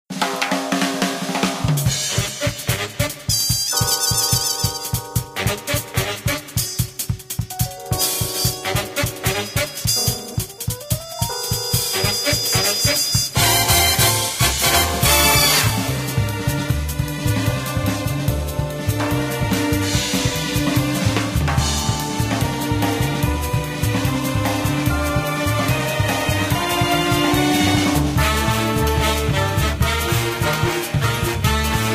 tension

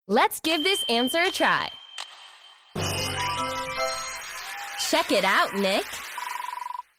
puzzle solved; plvspw-maya

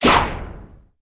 bow

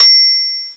ching

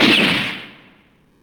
gunshot; deplume

